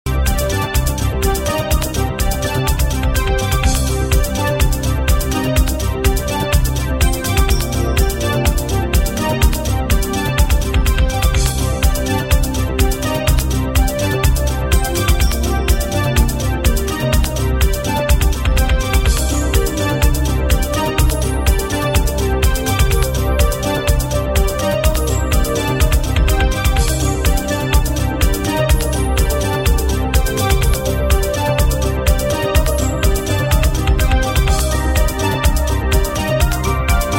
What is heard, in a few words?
spaceflight